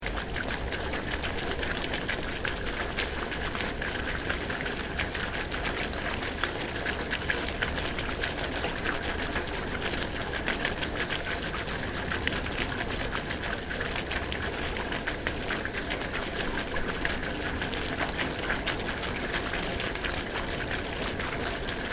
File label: Waterflow Heavy From Drain, Severe hail storm; Nature